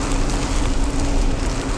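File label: bmx concrete freewheel; terrains; Tony Hawk's Project 8; XBOX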